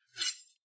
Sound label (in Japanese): まな板にある包丁を取る音